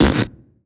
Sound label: linger bean cloud